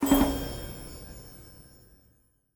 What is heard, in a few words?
Level Up Skill; dead in vinland the vallhund windows gamerip 2018